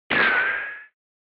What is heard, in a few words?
Retro Anime Gun